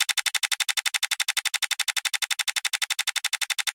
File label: bigwin increment; Wazdan